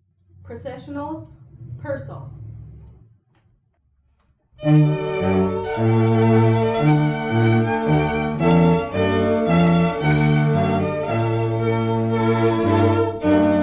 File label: Trumpet Voluntary, string quartet